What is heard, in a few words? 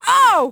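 f oof, female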